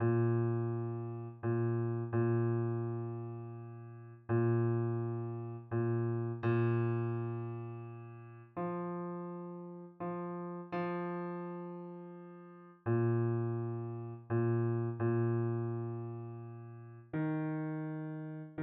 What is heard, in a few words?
Bass; Silent Night